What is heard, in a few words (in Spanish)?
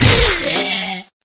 caida